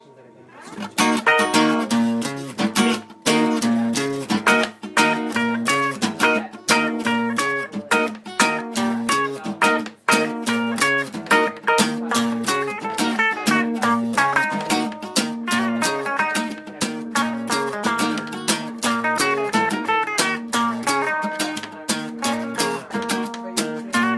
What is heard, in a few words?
acoustic electric